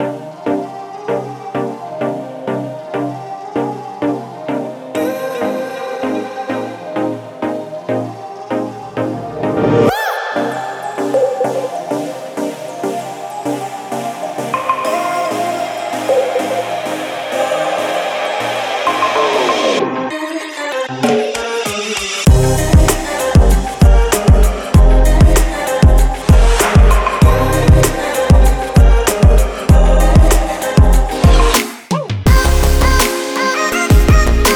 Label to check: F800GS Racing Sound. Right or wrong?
Wrong